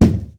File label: thud